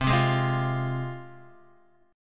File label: C Suspended 4th Sharp 5th, C, down, Guitar; Csus4#5 strummed